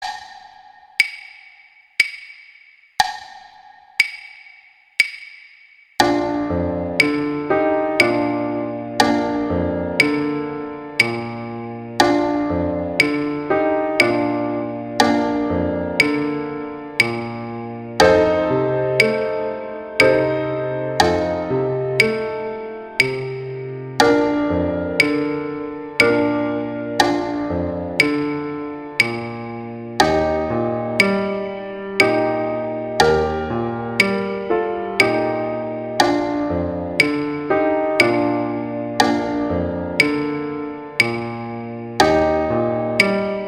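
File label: Silent Night accompaniment; Tbne; Gruber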